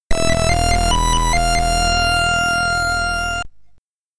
Stage Clear; Izanai; Ninja Spirit; commodore 64

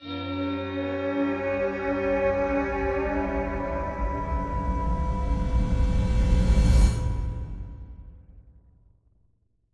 Music Stingers stinger Secret Door

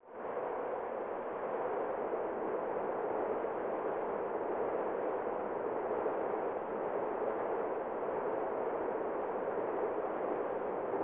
Crowd Ambience; Super Formation Soccer 96; SNES

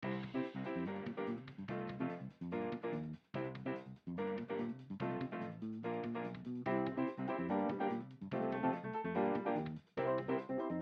Karaoke Fast; tamagotchi adventure kingdom ios macos gamerip 2024

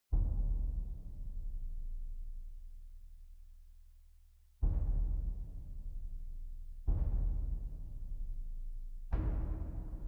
Drums 160bpm; evergate; ps5 switch windows xbox one xbox series xs gamerip 2020

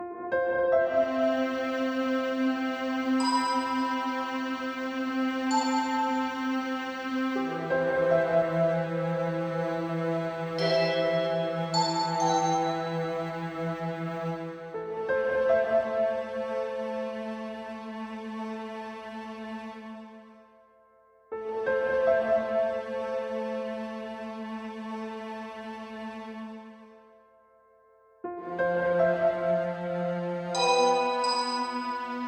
Rito Mountain Ambience; bards of the wild; music inspired by breath of the wild

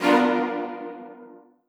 stinger uh Oh; Castle on the Coast; PS4

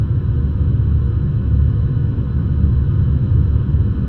Flame

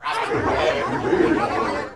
party arrive; SpongeBob Diner Dash

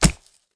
Pierces skin

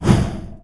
dragon spit

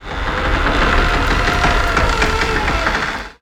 Door open; Zenonzard

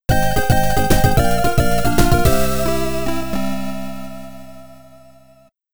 STAGE CLEAR (YM2203); New City Hero

